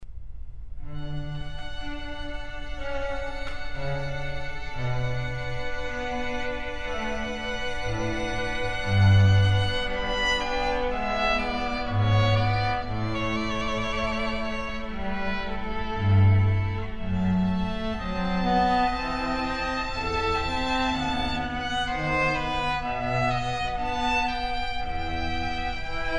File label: Air, strings